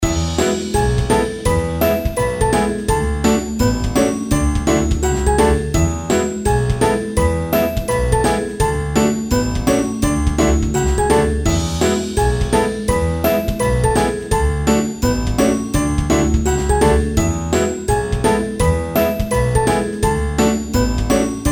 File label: bgm